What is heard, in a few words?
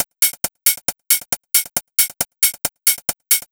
Hi-Hat Loop, Drums, Dance Beats